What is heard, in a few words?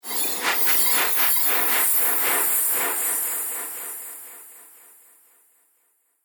Wizard Magical Robe; Magic Sound Effects; Fantasy Sound Effect